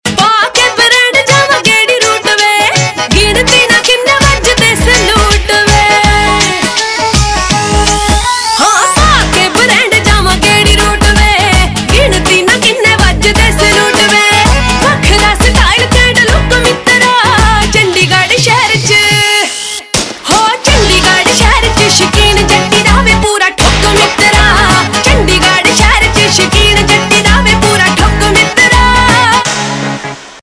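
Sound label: Ringtone, Punjabi Pop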